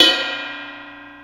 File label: Old Ride Bell; KJ Sawka; Drum n Bass & Beyond; Drum Tool Box